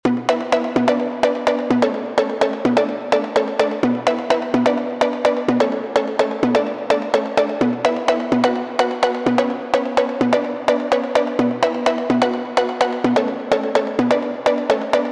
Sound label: Pluck; PatchFactory